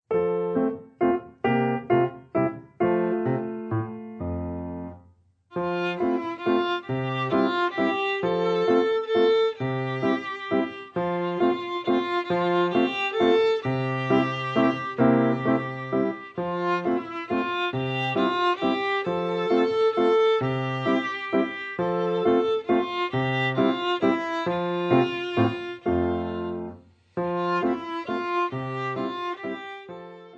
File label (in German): walzer